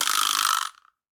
Vibraslap C Pan; Latin Percussion; Drum Hits; Wood; Ableton; Factory Packs

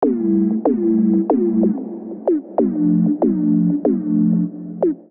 Climb Chord Pad A Minor 94 bpm; MIDI Clips; Tonal; Accompaniment; Ableton; Factory Packs; Chop and Swing

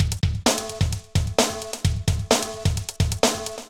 Drumkit; Ableton; Factory Packs

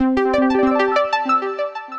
Ascend Squares, Synth Rhythmic; Ableton; Factory Packs; Synth Essentials

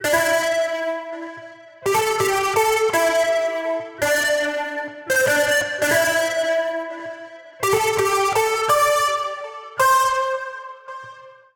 Drive Guitar C Major 83 bpm; Melodic; Tonal; MIDI Clips; Drive and Glow; Ableton; Factory Packs

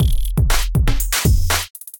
Electrified Kit; Electrified Kits

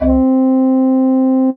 Caveflautz, P-50 Linear, Retro Synths; Ableton; Factory Packs; P-50 Structures; P-50 Partials

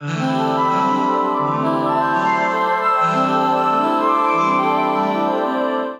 F Lydian 80 bpm; One Plus One Equals Three; Voice Box; Chromatic; Transformed

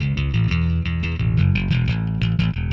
Indierock Bass, Dbm, Punk & Indie; Rock Hard

